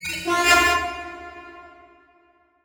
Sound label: Title Transition Bell; Adobe; Production Element